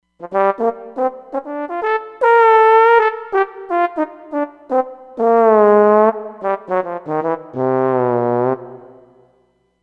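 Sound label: Soft Trombone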